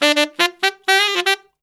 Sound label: SAX SOLO; Zero-G; Phantom Horns